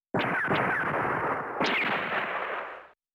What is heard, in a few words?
gunshot